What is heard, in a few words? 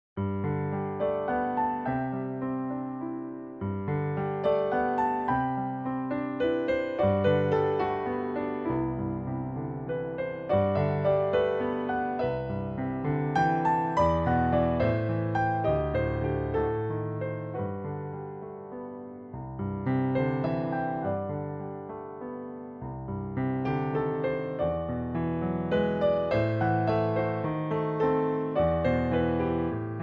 A Hint of Jazz, piano